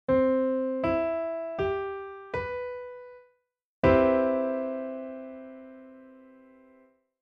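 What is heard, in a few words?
chord piano maj7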